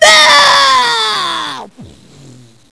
CLIFF

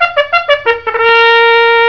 FANFARE

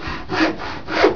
SAW WOOD

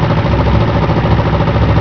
MOTOR MID, MACHINES

